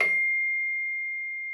Celesta C7; Xsample VOL-8

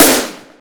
SR Snare; Yamaha PSR E305

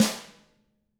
DW HIT, Drums; DW KIT1

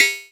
Percs, Elektron MachineDrum; SPS1 MKII

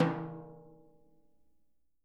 Living Drums, JZ XTOM 1; Sampleheads; Peter Erskine Living Drums

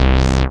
SYN SH, Roland; Sound & Vision - Gigapack I CD 2

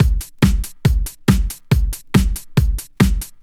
Addon Loop, Drum Loops 140bpm, Perc Loops

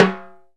LOTMB, Timbale

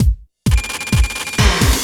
80s Funk Beat, Drumloops; Dr. Fink's Funk Factory

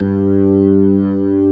B3 G 1, organ; AKAI S-Series CD-ROM Sound Library VOL-1

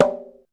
conga slap; Back In Time Records - Big Bang

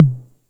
Tom, TR-606 Bent Samples; Sonic Foundry (Sony Creative Software); The Electronic Music Manuscript Collection; Battery 3 Sample Kits

